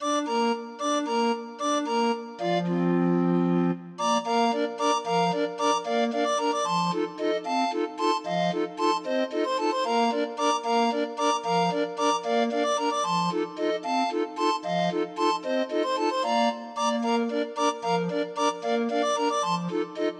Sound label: Cuckoo Waltz, Grind Organ; Demo of 20 note MIDI file